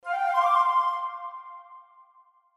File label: Crown Notification, Flute; HTC; Flute 1